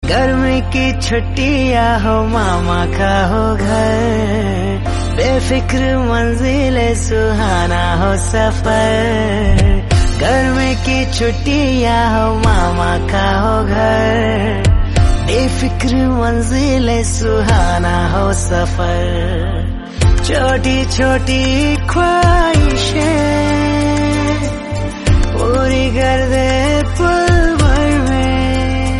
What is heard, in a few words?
hindi romantic